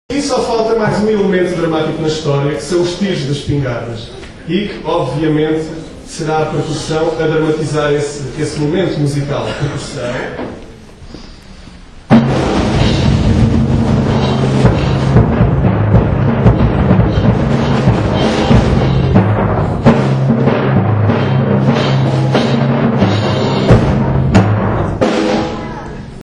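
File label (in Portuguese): a percussão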